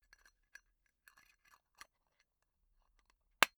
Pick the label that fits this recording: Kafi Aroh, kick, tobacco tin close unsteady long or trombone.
tobacco tin close unsteady long